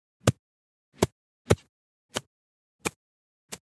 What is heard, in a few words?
golf club swing fail stereo; soundscrate